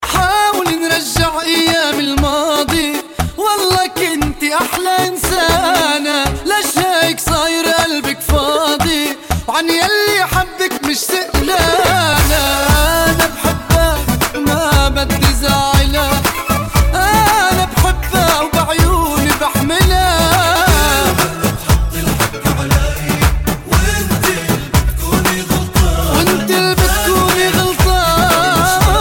Arabic Sad

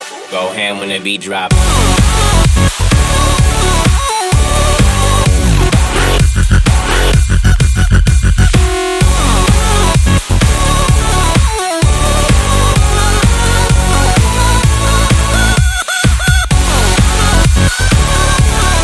Dirty Bass

